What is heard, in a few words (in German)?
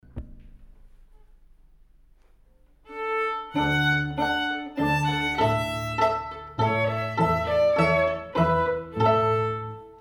die gedanken sind frei strings